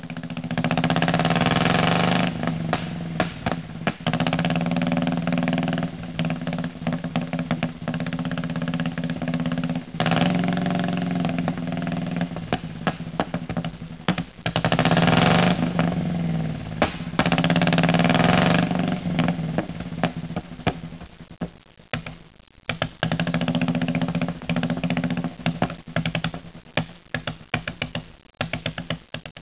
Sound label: nsu 600 ss